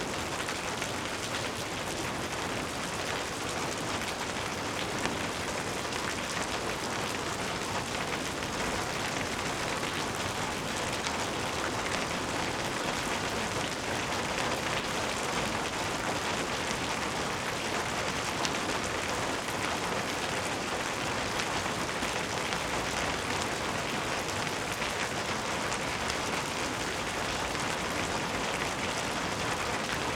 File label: Windy Rain on Pavement; Rain Sounds